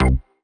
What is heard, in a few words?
Synth Shot C